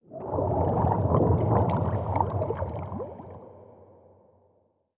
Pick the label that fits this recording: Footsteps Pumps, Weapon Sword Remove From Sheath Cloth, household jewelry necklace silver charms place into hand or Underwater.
Underwater